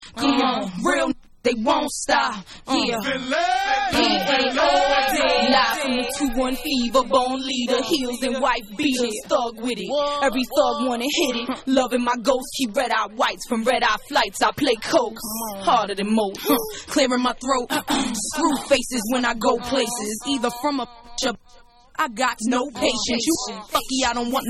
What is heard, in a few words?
Acappella